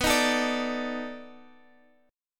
B 7th Sharp 9th B, Guitar, Drop D

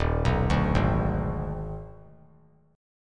Eb Major 7th Suspended 4th Sharp 5th; arpeggiated; 3,6,5,6; EbM7sus4#5

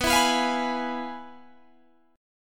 F Minor Major 7th Flat 5th, B

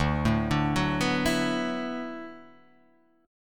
D 6th Add 9th D

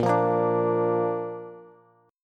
Bb Major, Bass; strummed; JGuitar

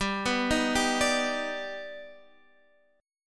Eb Augmented Major 7th G

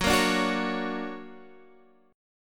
D Augmented Major 7th F sharp, guitar

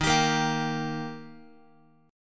D sharp Major D sharp Guitar, chord; strummed; x,11,10,8,11,x; JGuitar

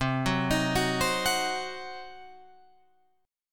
C Diminished C, Guitar Open F; arpeggiated; JGuitar

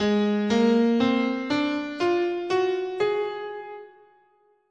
Ab Prometheus scale

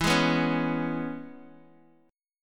E 6th, down, Guitar, Open D

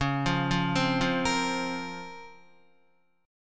Db Major 13th, Guitar; arpeggiated; JGuitar

